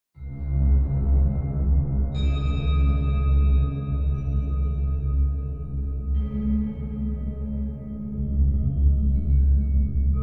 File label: Fatal Shrine Soundscape; music box music